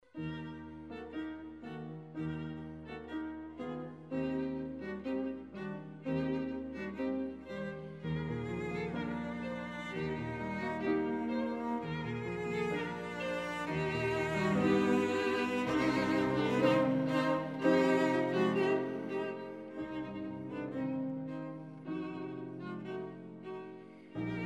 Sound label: trio; suk